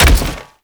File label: Step Default, Foley; Warframe; Sentients; Erra